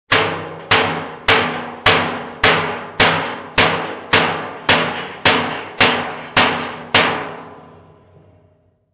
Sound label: Hammer on metal; hammering